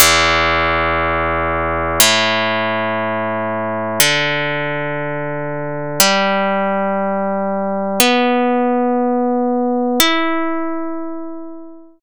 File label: karplus strong; nyquist; pmorales